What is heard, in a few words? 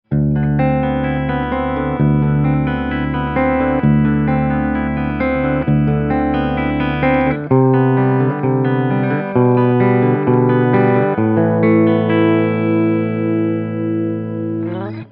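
Fame Paul IV IF Neck clean, guitar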